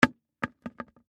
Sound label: Traffic Cone Down, Industry, Traffic, Cone, Set, Down, Wobble, Orange, Road, Cone; Traffic And Car; BlastWave